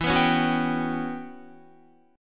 E Minor 6th Add 9th E down Guitar; strummed; 4E,4A,5D,5G,5C,6F; Em6add9

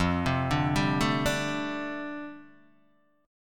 F 6th Add 9th F down Guitar Open D, chord

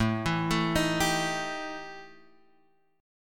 E Minor Major 7th Double Flat 5th A, guitar

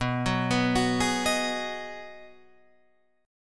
B 6th B down Guitar Drop D

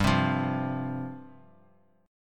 G 7th Suspended 4th G down; G7sus4 strummed

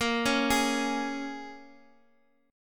Bb Minor 7th, Guitar, Open D; x,x,x,4,4,6

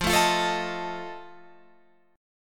F 7th F down Guitar Open F; strummed

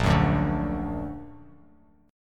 A sharp Augmented, Bass Standard; A#+ strummed; JGuitar

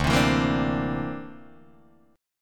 D 7th Suspended 2nd Sharp 5th D, Guitar DADGAD

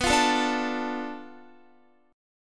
B Minor 7th Flat 5th, Guitar; Bm7b5 strummed

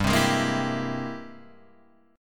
G sharp Augmented Major 9th G, guitar

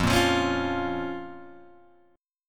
F Suspended 4th Sharp 5th F, Guitar Open G

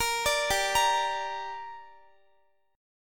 G Minor G down Guitar, chord; Gm arpeggiated

